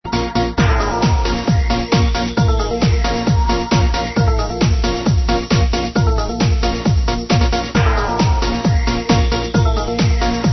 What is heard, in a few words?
trance